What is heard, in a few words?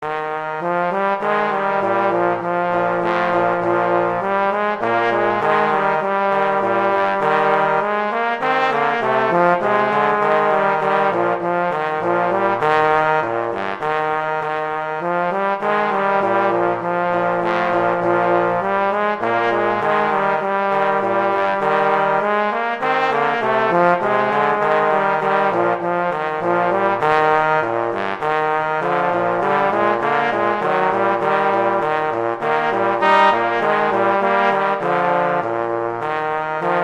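Duet, horn; Mozart; Duets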